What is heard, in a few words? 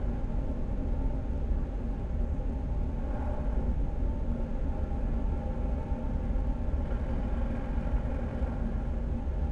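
construction site interior ambience; Star Wars Obi Wan; XBOX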